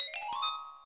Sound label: level complete